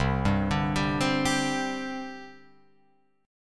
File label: C Suspended 2nd, C, down, Guitar, Open F; Csus2 arpeggiated